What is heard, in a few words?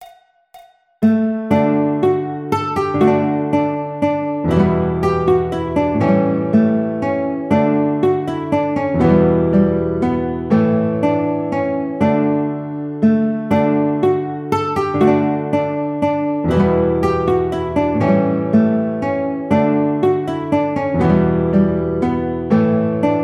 Ash Grove Guitar